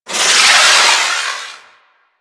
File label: glaive projectile; Warframe; Warframes; Ninja